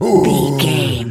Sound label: gorilla angry